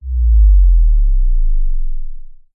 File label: sound design sub bass lfe bass drop; zapsplat